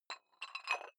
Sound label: dumbbell put on rattling big weight soft, fitnessstudio